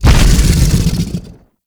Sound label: Biped Vocal Death; Warframe; ManInTheWall